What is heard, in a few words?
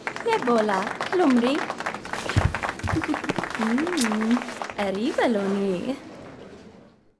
dance outro fair; Sid Meiers Pirates; XBOX